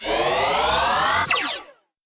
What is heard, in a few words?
scifi weapon charge and blast